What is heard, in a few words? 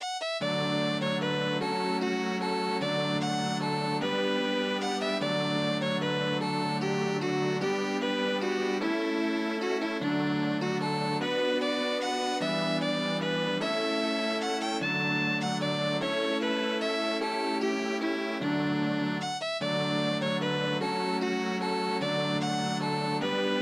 waltz; canal